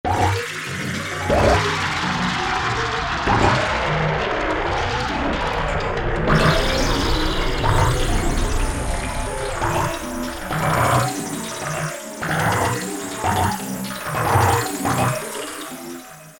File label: Deepsea, Roland U-220; Factory Patches